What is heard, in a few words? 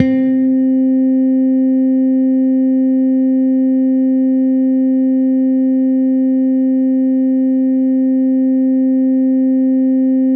C4, SynthLine